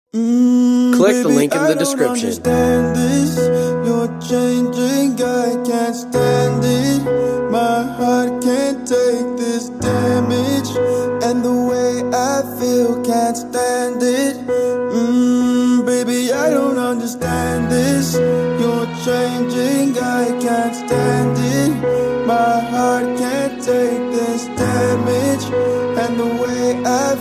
iphone xr ringtone

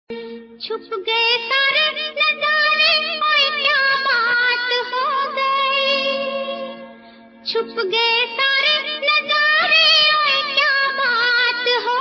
romantic hindi ringtone

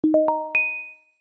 galaxy note 8 notification ringtone

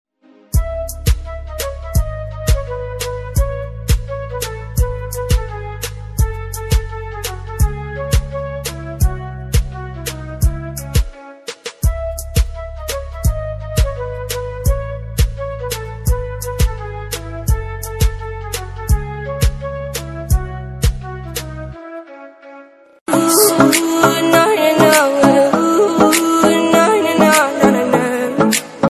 english dj instrumental ringtone; tiktok

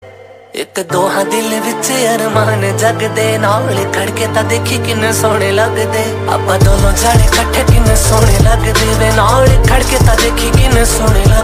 new punjabi ringtone

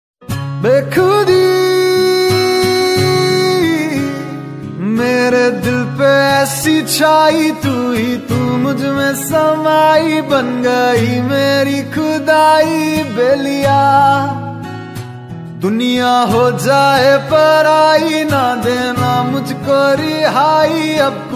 bollywood ringtone

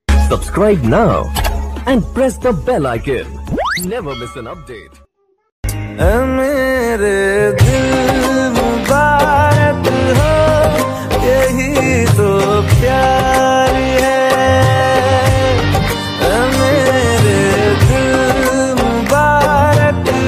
bollywood ringtone

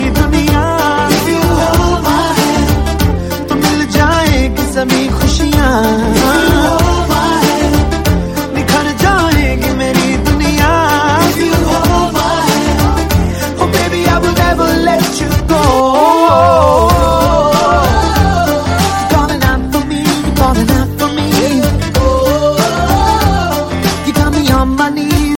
very special romantic for mobile phone ringtone

